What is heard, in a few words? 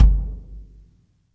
real kick; REAL LIVE KITS